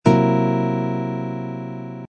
c dominant seventh flat five chord voicing, guitar